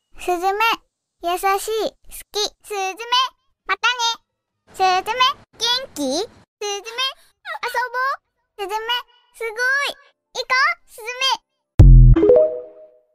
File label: ringtone